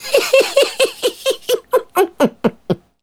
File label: Male Laugh; Laughs; Sonic Foundry (Sony Creative Software); Vocal Toolbox